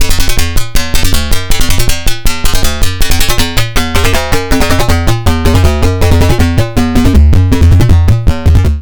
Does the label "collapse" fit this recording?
No